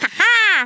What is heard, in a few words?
LAUGH, Scouser